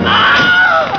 scream male

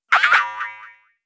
Boing rebound series

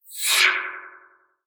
Electric wash

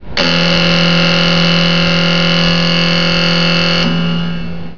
buzzer

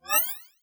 Alien passby

